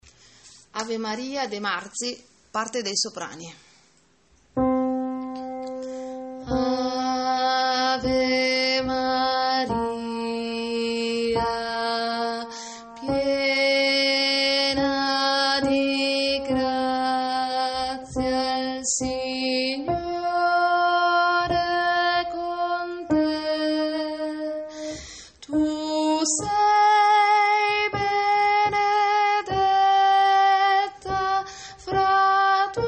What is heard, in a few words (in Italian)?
ave maria soprani